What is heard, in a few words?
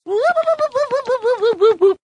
SMS Ringtone